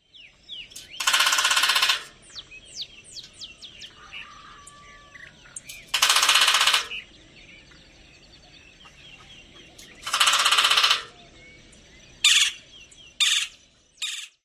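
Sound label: Golden-fronted Woodpecker drumming on metal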